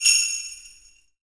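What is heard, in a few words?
R-100 sleigh bell; EdgeSounds; Drum Mashines; R-100 DRUMS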